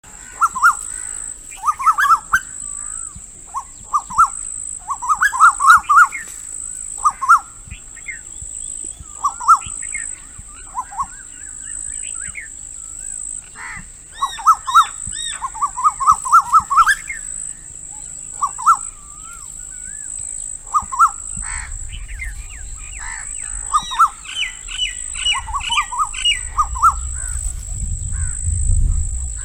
Asian Koel

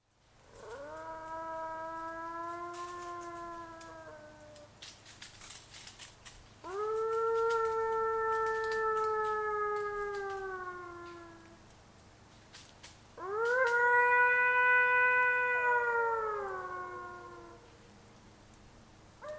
Jackal Sound

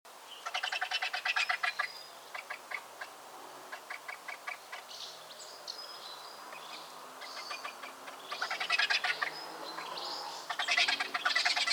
Vulture Sound

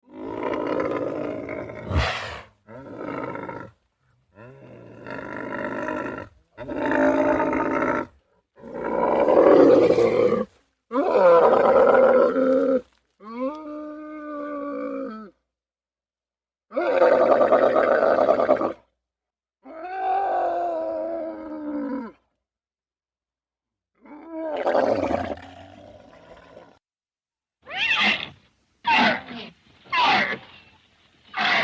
Camel Sound

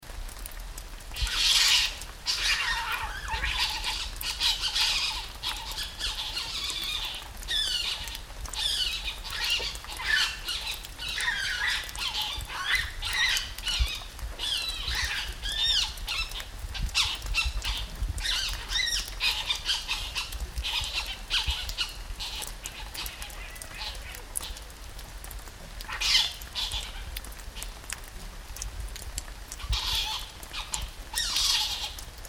Civet Sound

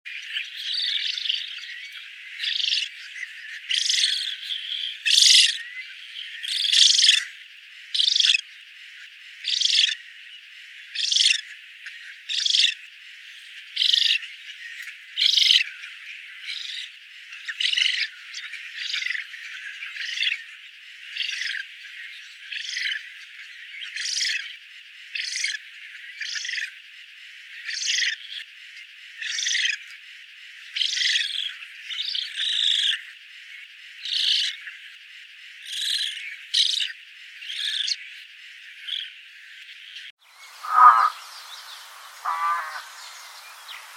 Spoonbill Sound

